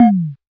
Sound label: Rhythm Tom + Perc, Realistic Concertmate-670; Layered Kit